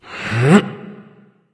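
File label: grunt lift, male; smite; voicepack; rama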